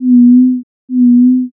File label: owl